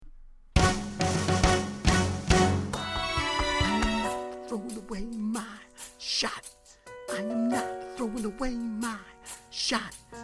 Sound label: soprano